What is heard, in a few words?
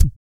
WOOF DOWN, Vocal Blues Soul; Spectrasonics; Planet